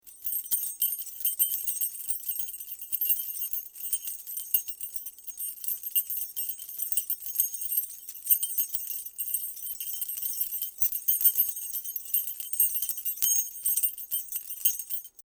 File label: keys